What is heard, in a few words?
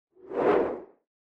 Camera Motion Mid; カメラモーション; シーン・カメラモーション